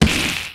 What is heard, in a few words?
Melee Magical Light; Kilta